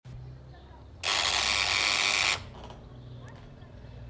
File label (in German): erstes Geräusch